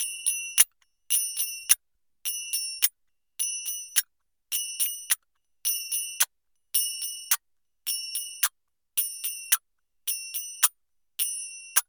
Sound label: Small cymbals